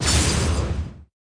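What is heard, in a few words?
Hit long range ice shell; The Denpa Men; Android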